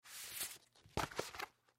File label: Chart Folder Movement; Hospital; Emergency